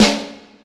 subtle reverb snare drum sound g sharp key, Royality free snare one shot tuned to the G#